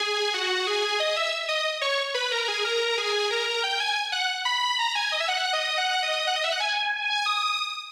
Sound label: Intro Lead